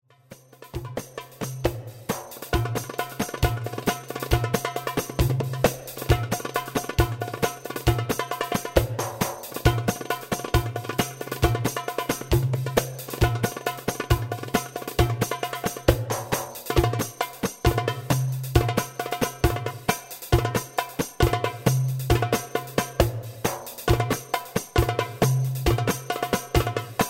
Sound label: tabla